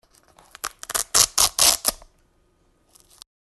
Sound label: Velcro Ripping